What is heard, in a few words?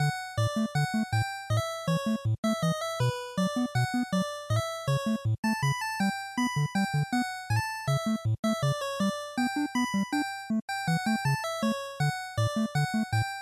Sports Stage Loop, bgm